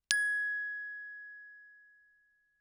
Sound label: bells plastic ff Ab6; Percussion